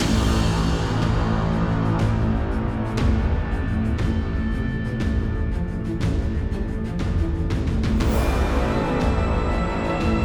Fighting Phase; Nebulous Fleet Command

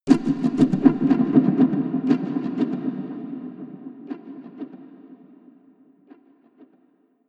tribal echo

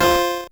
core squish C3 F2

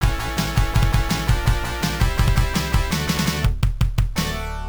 Swamp Win; Dungeons & Dragons Warriors of the Eternal Sun; Genesis

